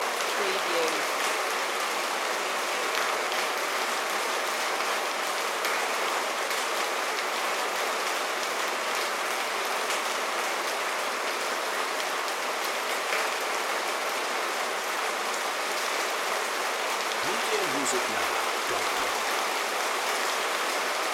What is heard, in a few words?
rooftop rain exterior loop